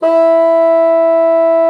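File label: bassoon forte E3; Xsample VOL-6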